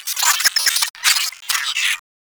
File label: space drone attack; Warframe; Corpus Space Drone